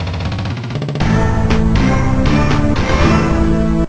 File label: End of Quarter; NFL Blitz 20-03; GBA